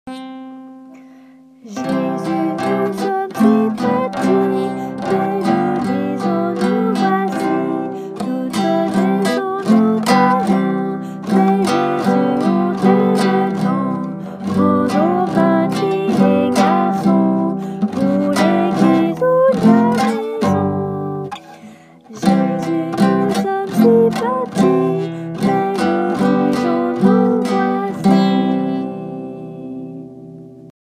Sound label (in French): chant